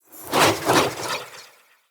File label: Staff Caster Proj Whizz By Heavy; Weapons; Player; Staves; Starter Staff Caster